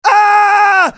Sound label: usec fall; eft; scales